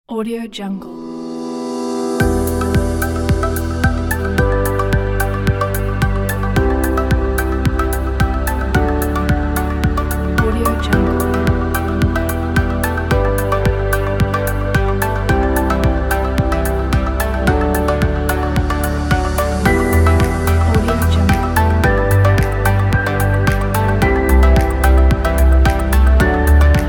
ambient minimal

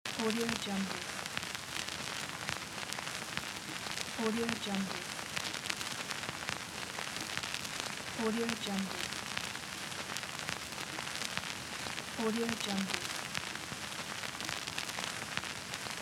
vinyl noise loop

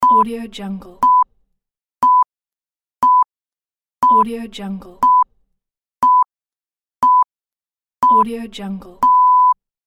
countdown beep tones

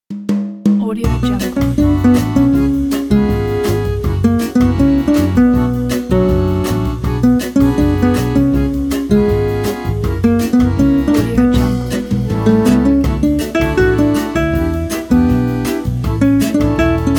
latin loop

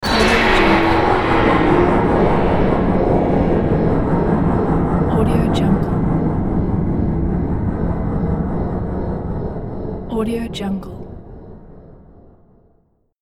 science fiction ambience

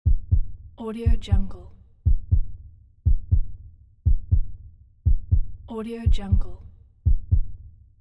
heart beat

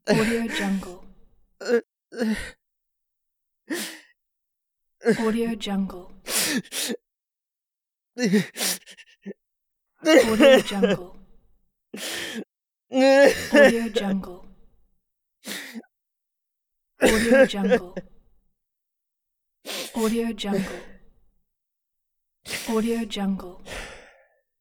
male crying loud sobs

